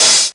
hat open high noise brush, Chicago House Drum Hits